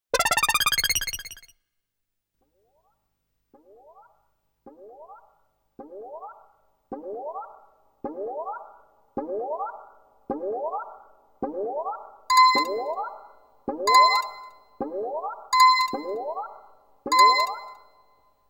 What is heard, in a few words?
Coin; E.D.F. Earth Defense Force